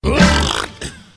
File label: rahk; ooh ahh